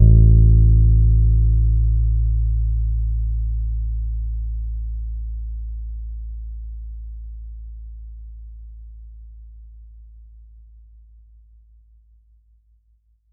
Es A2 soft, Bass, Pedulla Thunderbass; Giga Samples Collection

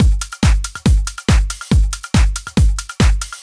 Kick Loop, 140bpm

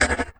DOOR SNAP, Anime Sound FX; COMIC

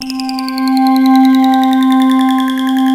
RAINYDAY, ENSONIQ VFX; Rubber Chicken Software Sample Bank

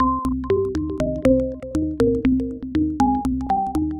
Modern Synth, Synth Textures; Sonic Foundry (Sony Creative Software); The Electronic Music Manuscript Collection

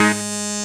SYN G, BUZZ SYNER

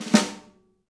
Pop Snare Drag; Snares

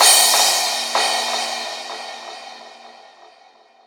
crash (echo); Sonic Foundry (Sony Creative Software); Ugly Remnants VOL-2; Beatstuff

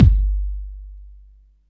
KICK, Tekno Industrial; East West

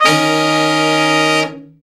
BRA SECT; BRA SECTIONS

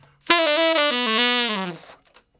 Ten Sax Straight (B), R&B; Solos

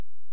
Syn Vox F#3, Pad; HaloPad

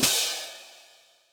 H Cym Opn, Drum Machines, Yamaha DTXpress, Cymbal

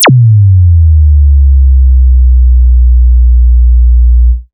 sub zap; Best Service Alpha Dance vol.2; ZAPS+THUMPS

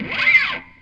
METAL FX; InVision Interactive; Lightware VOL-1; Instruments & Percussions; METAL 5THS+

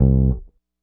Bass Ending, 70s Analog Funk; Sonic Foundry (Sony Creative Software); What it Is! 70s Analog Funk

